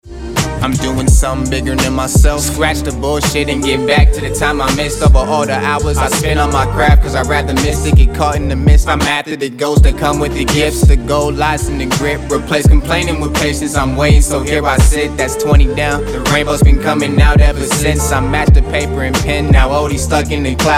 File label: HIP HOP